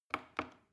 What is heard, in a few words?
plastic button small click regular slow